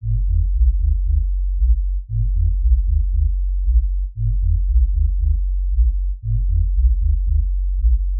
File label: bassline